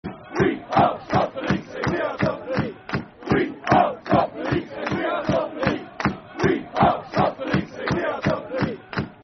We Are Top Of The League Football Chant (Soccer Song); Milton Keynes Dons Mkdfc